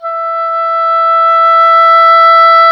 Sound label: oboe dry; Roland L-CDX-03 Disk 1; Oboe 4-8